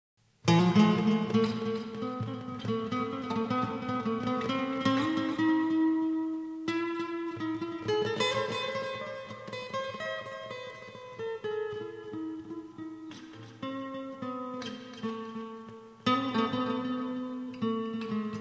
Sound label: desert test